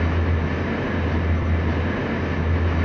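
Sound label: Engine Drone Interior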